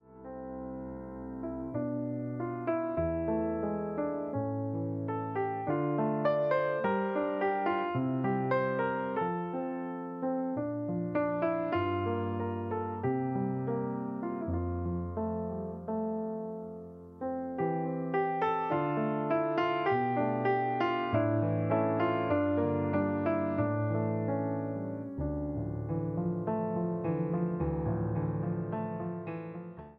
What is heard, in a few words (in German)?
Piano Instrumental